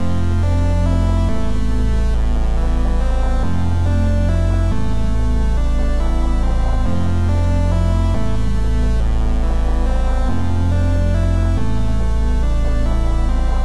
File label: 140 BPM A Min, Melody; Cymatics; 2022 Melody Collection; Wavy